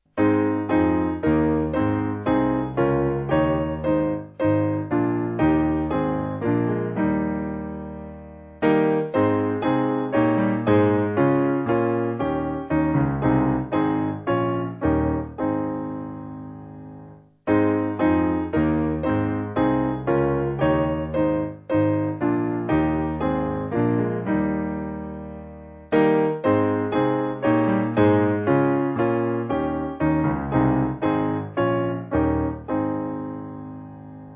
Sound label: Thou Art The Way, St James, Piano; 4/Ab